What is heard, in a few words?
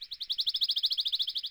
warbler